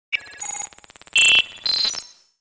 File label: Futuristic data calculations short